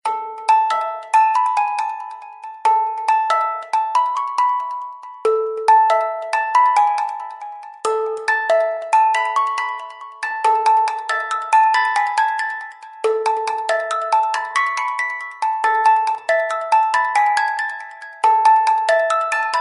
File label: soft ringtone